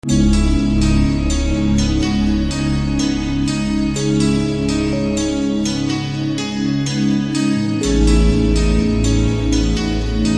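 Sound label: background music Telugu Ringtone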